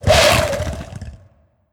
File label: Gargoyle Horns Fly